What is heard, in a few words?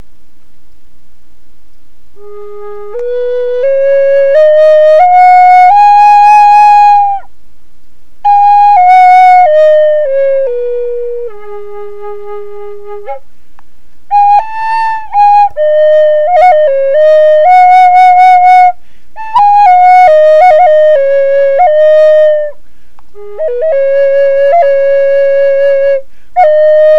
G sharp 99, flutes